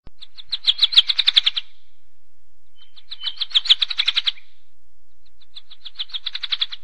sedge warbler; animals

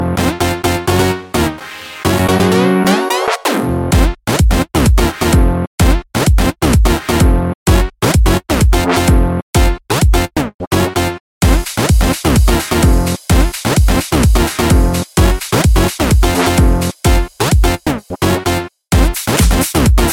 Electro

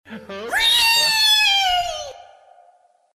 REEEEE

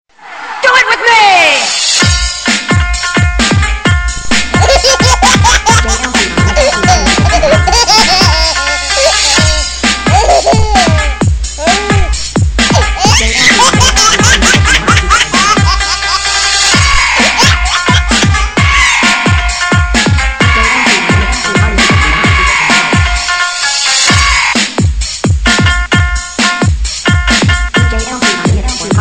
dugem, sms

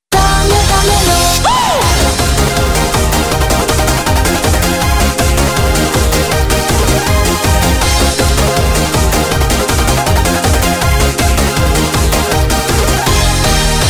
Ska

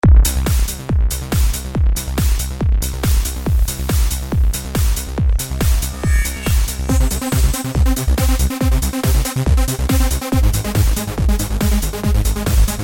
bass trance

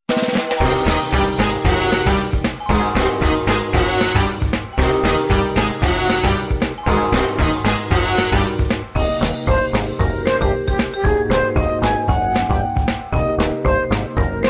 Jazz Funny; Joker

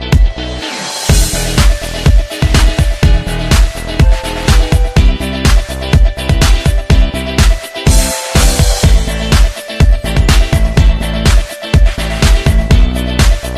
Dream Pop

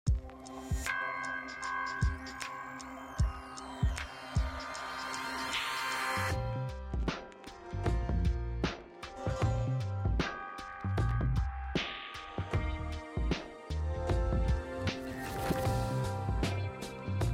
house, dance

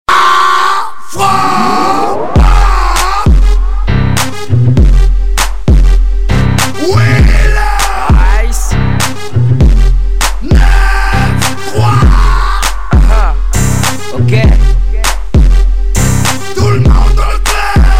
break crazy, hip hop ringtones

